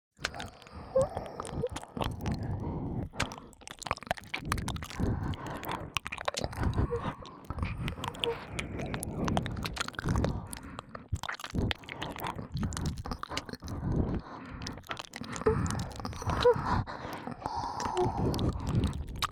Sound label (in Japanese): (両耳) お耳直舐め耳舐めエッチ, ASMR; 白耳; パンパン系効果音版